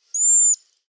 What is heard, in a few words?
Cedar Waxwing Seet